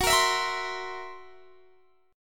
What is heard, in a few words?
Fsharp 7th Flat 5th down Guitar Standard; strummed; JGuitar